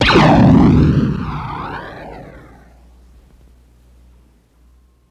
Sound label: Laser Cannon Blast